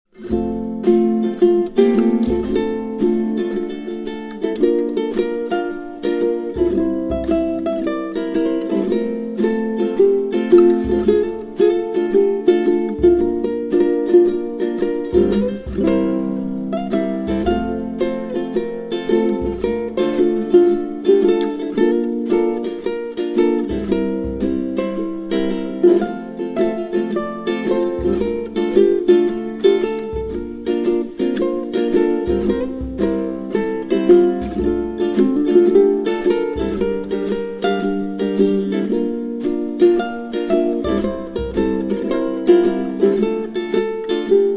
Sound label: Healing Relaxing, Ukulele